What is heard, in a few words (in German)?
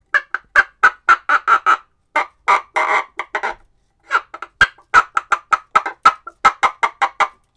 Gackerndes Huhn